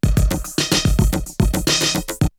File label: Drumloop; ZERO G - Datafile DISC 2